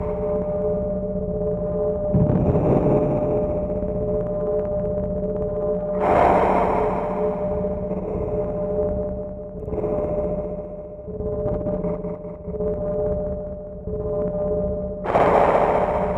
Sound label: Heavy Machinery, Background Machinery; Julian Ray